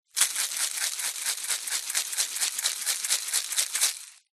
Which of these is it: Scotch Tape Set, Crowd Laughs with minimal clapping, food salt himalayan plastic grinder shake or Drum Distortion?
food salt himalayan plastic grinder shake